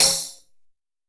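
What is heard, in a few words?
FX TAMBOURINE, PERCUSSION; AKAI S6000 CD-ROM - Volume 5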